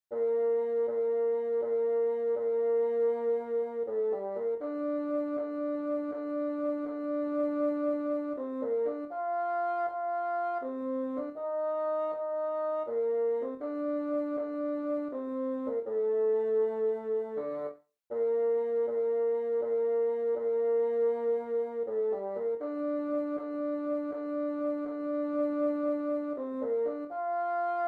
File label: bassoon